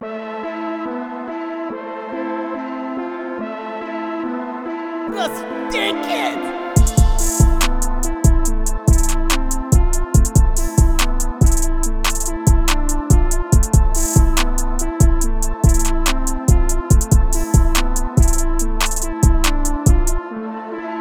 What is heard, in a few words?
142 BPM Key A Minor; BrasiBeats